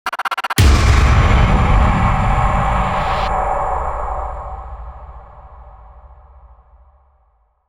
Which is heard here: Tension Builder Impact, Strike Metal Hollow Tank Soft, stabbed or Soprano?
Tension Builder Impact